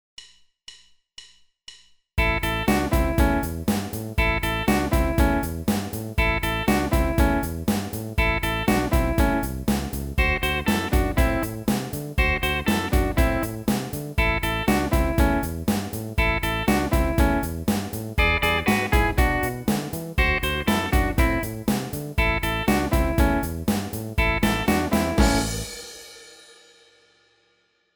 Double-Stop Rock, guitar

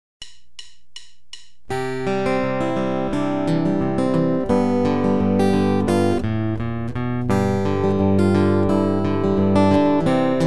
guitar

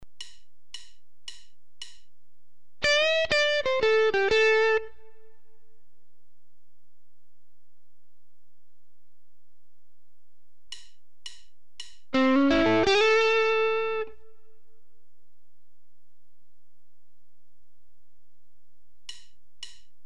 Typical blues moves, guitar

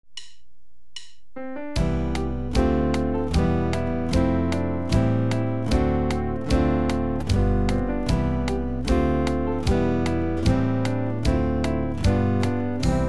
Oh, Susanna, guitar

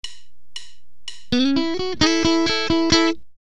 –like slides, guitar; Chuck Berry–like slides

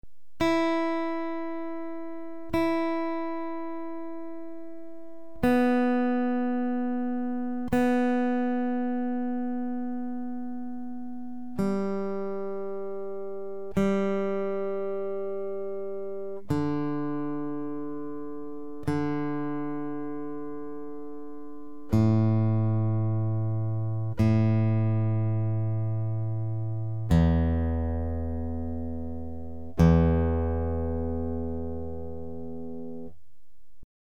Tuning Reference, guitar

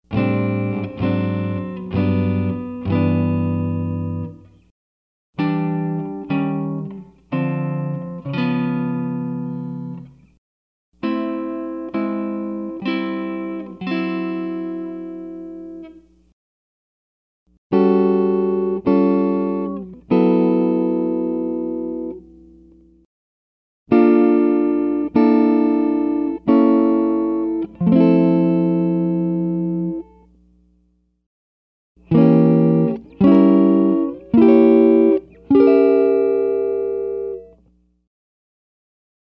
Various jazz moves, guitar

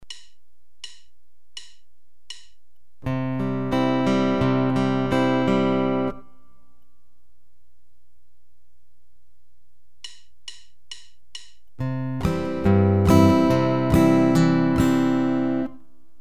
Lullaby and thumb-brush patterns, guitar

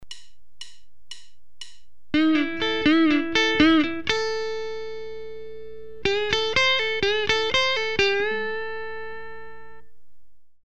Bending in Box I, guitar

